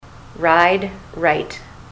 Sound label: ride right